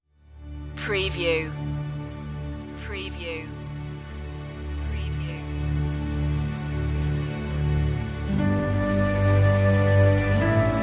flute strings mix

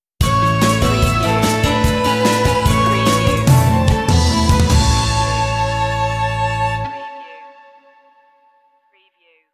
clear blue stinger

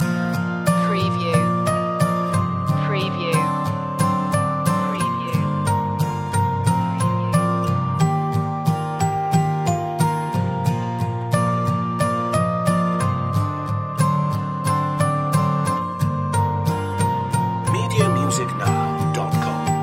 loop

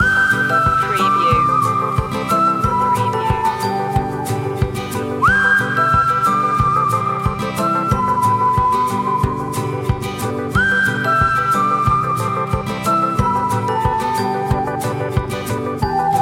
whistle along loop

